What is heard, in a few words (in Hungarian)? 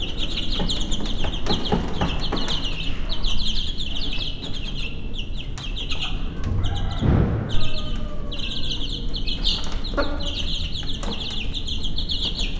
kotló egyhetes csibékkel, pusztai szél, sárga tollú magyar tyúk; Hortobágyi Nemzeti Park